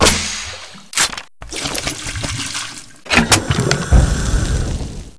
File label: reload flame